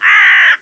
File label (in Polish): wrona